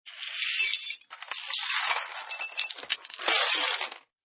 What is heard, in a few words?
Sweep Up Glass Debris, Sweeping up glass debris; Shards of glass being moved and slid along ground, broken glass movement; LynneMusic